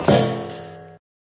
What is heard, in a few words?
metal grate, footsteps